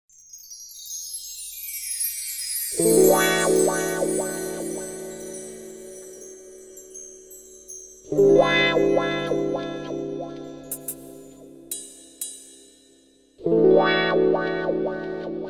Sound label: break beat